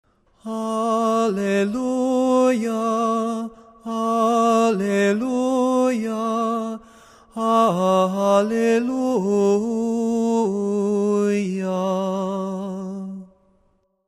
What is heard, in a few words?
Tone 6 Alleluia